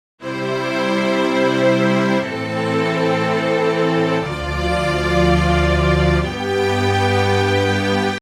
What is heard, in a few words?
strings sound